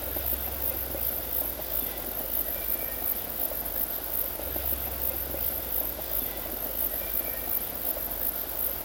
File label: forest life, ambient